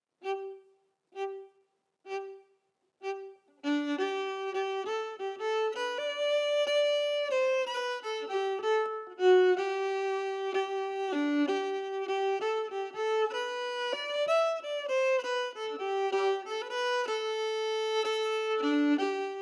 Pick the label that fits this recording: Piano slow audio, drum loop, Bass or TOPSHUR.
Piano slow audio